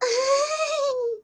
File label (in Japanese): 笑い; 音MAD; Cookie